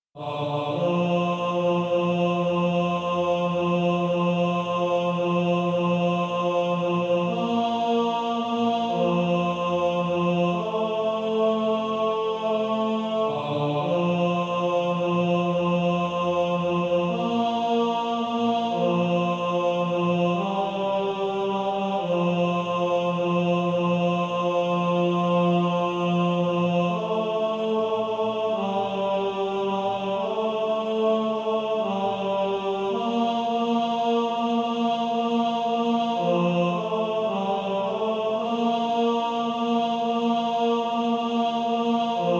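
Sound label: Male Choir, tenor 2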